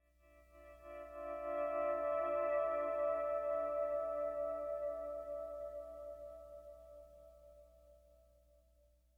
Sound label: Pleasant Guitar; ML Pleasant Guitar Mood 4